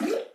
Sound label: paint trickle